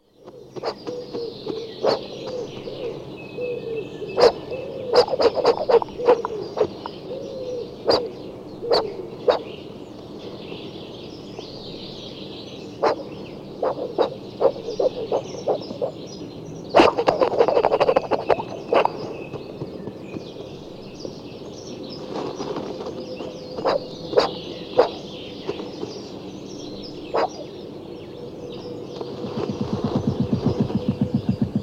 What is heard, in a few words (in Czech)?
Jezek zapadni funeni pri pareni